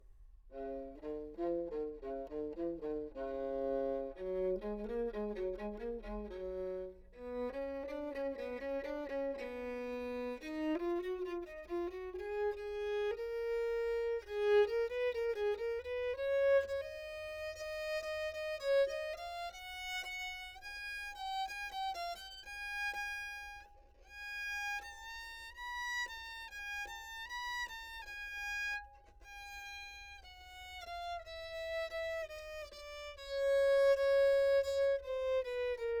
exercise, treble viol back microphone, Anechoic

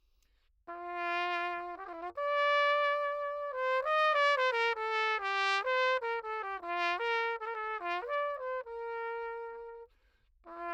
piece, pocket preachers cornet, Anechoic; OPENAIR

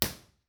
dales, trollers gill; site2 4way mono processed

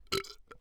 burp; OPENAIR; Anechoic

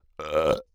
burp; OPENAIR; Anechoic

